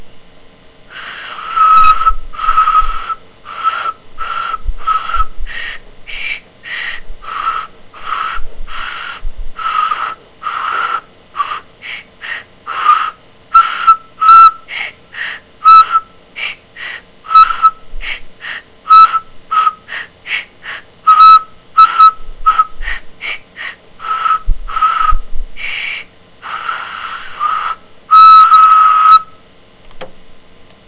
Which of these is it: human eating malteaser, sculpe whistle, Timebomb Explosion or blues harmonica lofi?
sculpe whistle